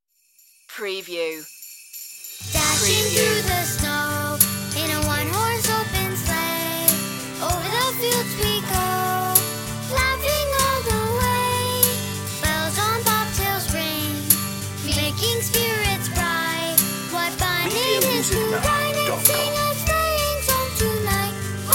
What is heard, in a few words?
children child solo vocal